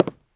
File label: wood step; Golden Crayon Sounds